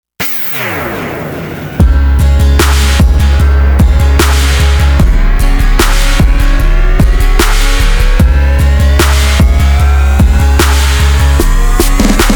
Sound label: Downhome Trap